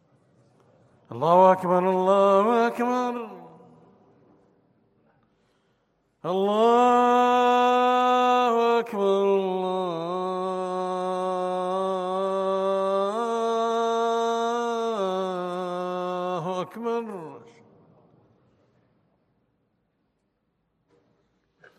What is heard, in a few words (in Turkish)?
ezan